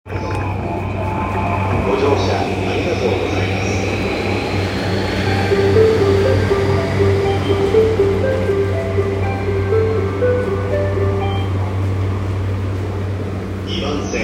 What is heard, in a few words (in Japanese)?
JR 山手線 目白 CW, train sounds